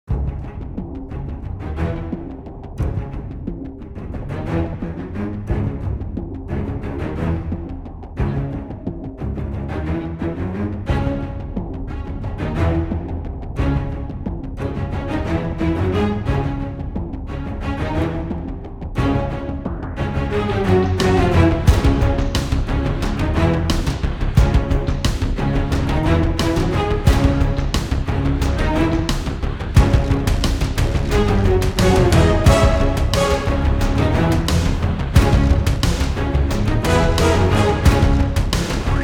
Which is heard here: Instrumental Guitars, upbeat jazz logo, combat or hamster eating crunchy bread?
combat